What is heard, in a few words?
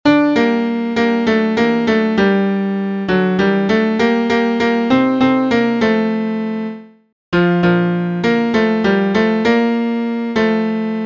Piano; Allez par toute la terre